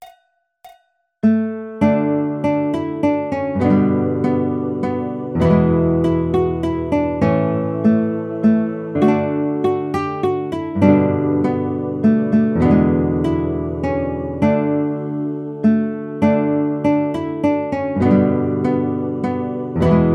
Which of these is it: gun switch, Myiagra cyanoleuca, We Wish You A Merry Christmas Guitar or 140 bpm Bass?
We Wish You A Merry Christmas Guitar